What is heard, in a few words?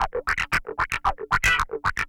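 guitar; ZERO-G; Datafile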